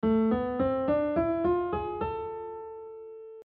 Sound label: A harmonic minor